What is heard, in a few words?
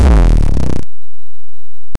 a short sfx when the, upgrade